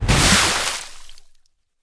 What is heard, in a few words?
victory water exit; Smite; Sol